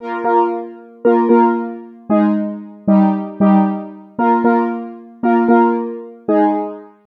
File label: MOOG; USB Soundscan vol.32; Roots Disco; AKAI